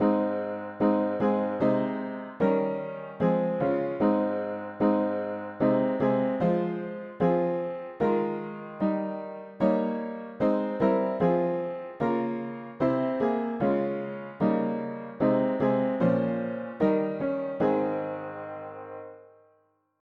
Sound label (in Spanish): mono piano; hymns; Himnario pequeno 2Pte